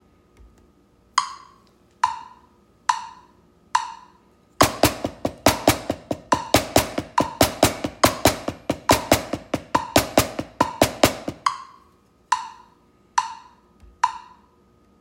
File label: single hand, drums